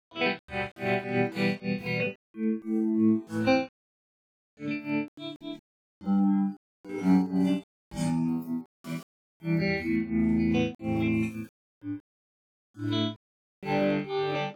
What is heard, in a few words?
guitar; sound source separation methods; ADRess